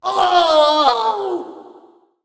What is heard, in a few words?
Swordcaster Old Death Vocals